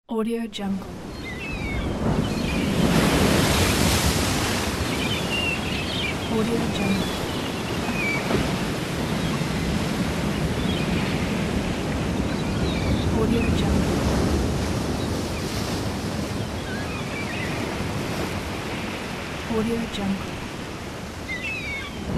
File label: waves and seagulls